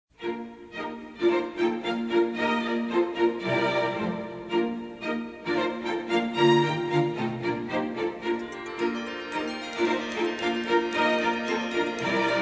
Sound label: Nutcracker